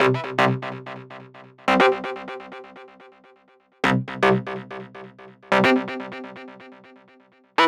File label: Synth